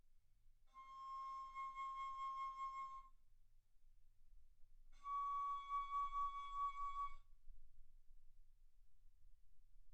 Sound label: Bass Flute C6 Db6; ff